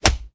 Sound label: Pirate Barrel; Rabbids Multiverse